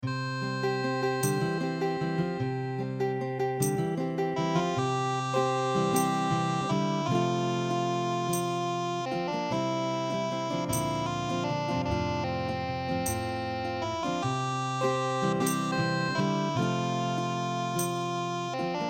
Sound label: Folk